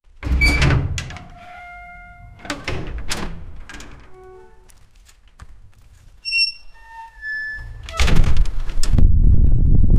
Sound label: rusty gate, whimsical; from banjo